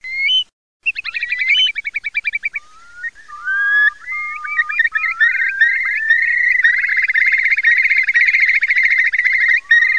CHIURLO

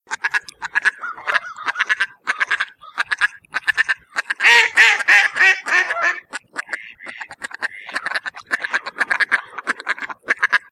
Germano

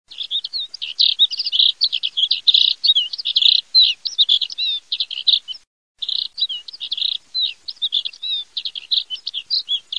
sordone

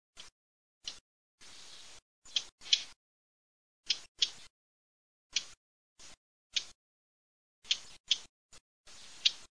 capinera

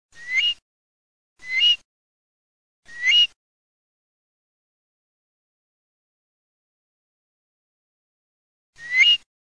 chiurlo

